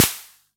SD B; Olson XX-100

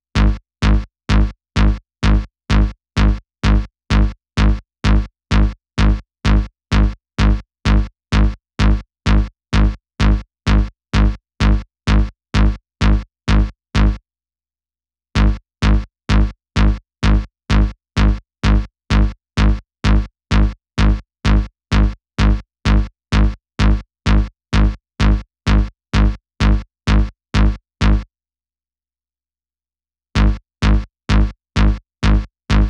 128BPM Bass Off, Root G#min; Demo Constr Kits; Break the Chains; Bassline

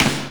TURBO SNARE

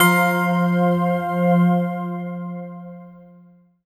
KEYS; Houseworx

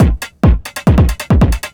drm 138, techno drum loops

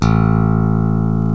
FACTOR PIC A, BASS; Greytsounds

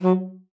STXP F#3, ALTOSAX; Xsample VOL-2

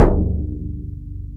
Bodhran Lo; Planet Earth; Digital Sound Factory Planet Earth

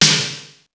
SNR; Club-50 - Foundations Roland; Cheez Snrs

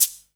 PERC, 180 BPM; Ueberschall; Drum & Bass Resonance

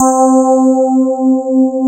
SPARKLES; Wizoo - Powered DX

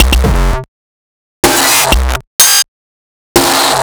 Percussion, 125 BPM; Sonic Foundry (Sony Creative Software); Underground UK House & Electro

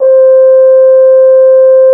FR HORN G4, French horns; Greytsounds VOL-1

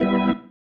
Stab One Shots, Organ

